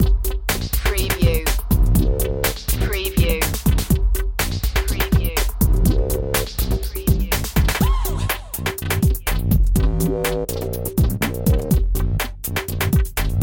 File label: phat mama loop